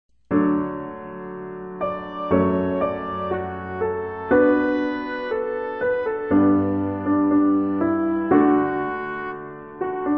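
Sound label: soprano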